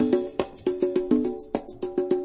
classic hh Perc Loop; STYLE KITS (Hip Hop, Etc); Classic Hip Hop Mega Kit

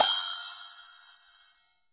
chappa, Chappa and Chanchiki Cymbals; ETHNIC and WORLD PERCUSSION

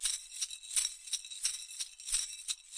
small shaker, 086 bpm, drum loop

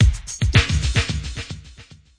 110 thick echoes, drum loops and breaks; 101 to 110 bpm

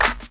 snare

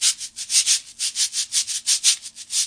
180 cabasa hard, drum loops and breaks

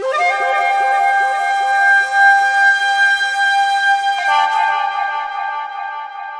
flutey echo intro blast; Flutes

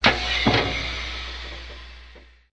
factory discharge drop, Factory Percussion

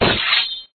sword slash; Weird and Interesting Hits; single hits

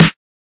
Snares; single hits

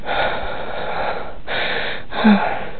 breaths, Silly Lo-Fi Orgasms

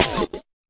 fx drum; Electro FX

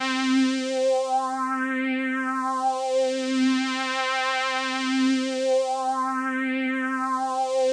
Elka C3, synth; elkaph synth

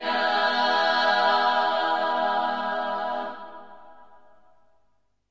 Slavic Choir E Yaah, Slavic Maybe Bulgarian Choir

